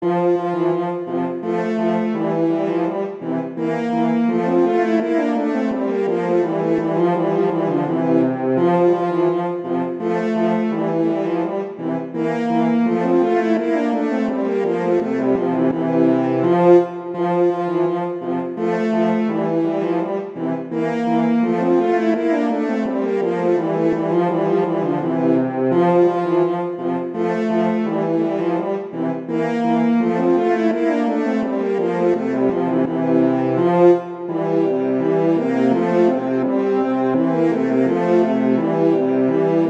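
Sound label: Duet, horn; Mozart; Duets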